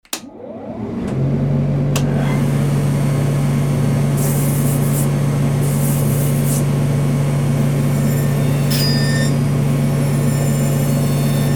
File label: machine